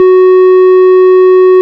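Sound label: ORG, Farfisa organ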